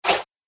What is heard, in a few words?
App Game - Sticky Splat; Splat Sound Effects